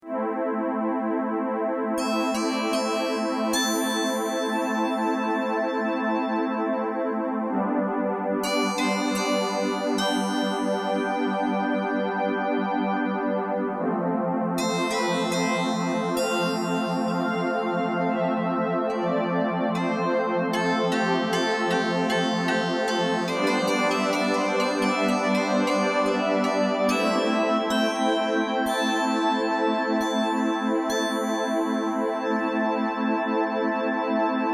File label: FM DX7 China; En attaquant staccato et piqué on a une sorte de cithare; en jouant legato et en douceur on a une nappe